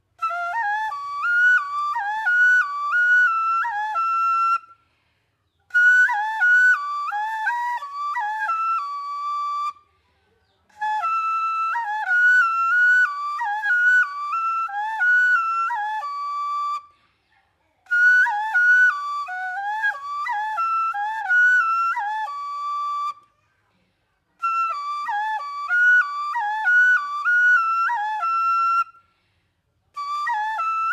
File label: Black Lahu flutes, notch flute solo